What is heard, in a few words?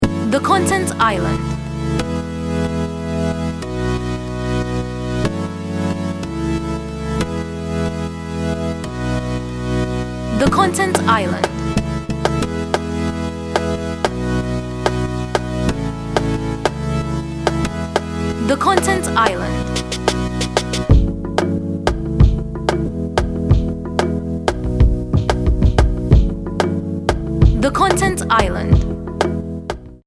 92 BPM